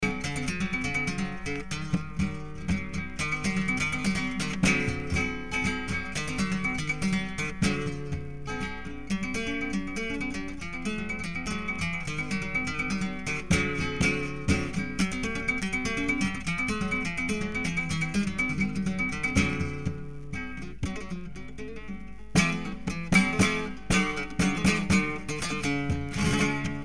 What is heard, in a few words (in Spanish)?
Buleria